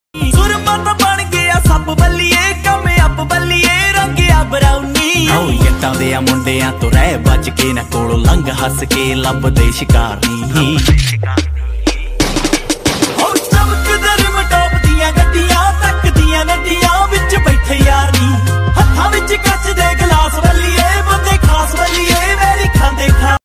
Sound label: Punjabi Tune Ringtone